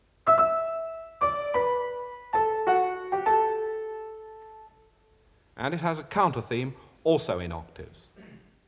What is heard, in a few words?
Music Example (Piano)